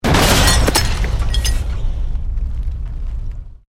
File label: Explosion Medium Metal Glass; Created explosion effects, house, building or vehicle effects; Bombs